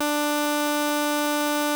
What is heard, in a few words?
Prophet 5 D5, Synthesizer; Hollywood Edge - Giorgio Moroder Rare Synthesizer Collection